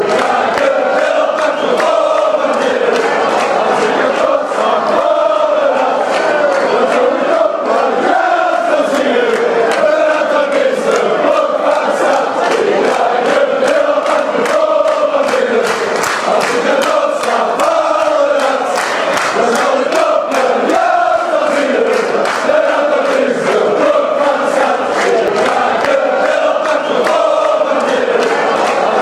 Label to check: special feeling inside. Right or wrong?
Right